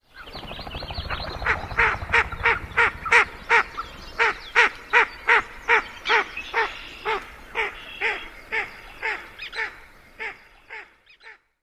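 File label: female takes flight, Ring-necked Duck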